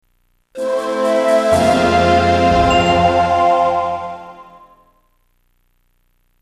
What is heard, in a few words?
machine part; Indiana Jones and the Infernal Machine